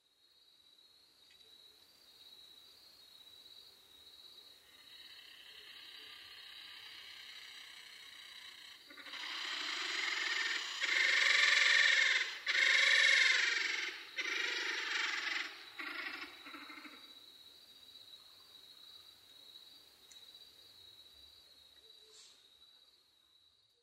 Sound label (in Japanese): musasabi